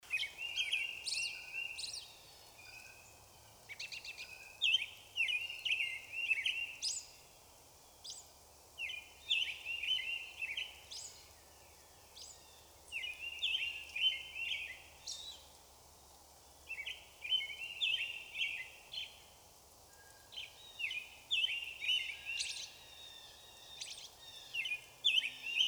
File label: Misc And Blue Jays One Screemer